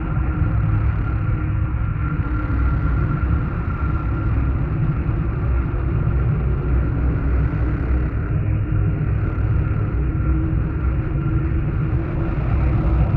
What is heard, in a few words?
weapon windup low pulse; star eater; Stellaris; nemesis